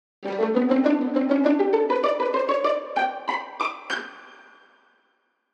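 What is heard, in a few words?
VIOLINI pizz, violins pizzicato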